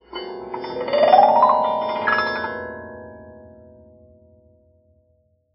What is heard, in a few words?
GLISS; SPECIAL FX; Xsample VOL-1